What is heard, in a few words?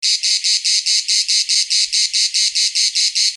Cicadas